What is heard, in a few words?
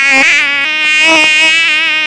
mosquito; insects; Sound Effects for Multimedia - Volume 1